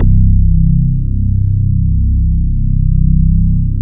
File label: MKS BASS